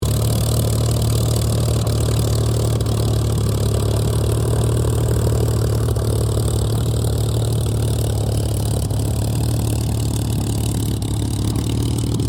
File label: AIRCRAFT SUPERMARINE SPITFIRE MK-XVI TAXI COMMS; Airplanes; Vehicles; Airplane Sound Effects